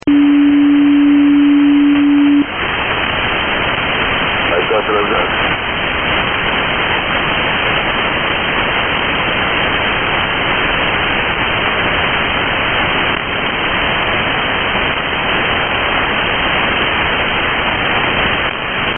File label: 6919.7 AM; CLAG - The Chris Lobdell Appreciation Group; SDR recording